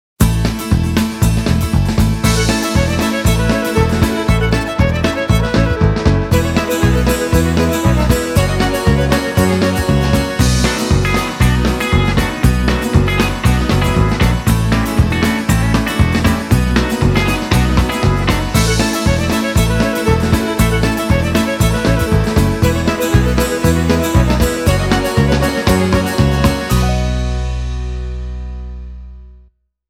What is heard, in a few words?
Fiddle Magic